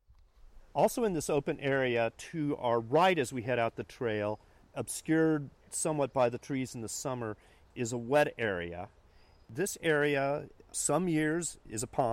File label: Beaver Pond Green Frog Warblers; Cranesville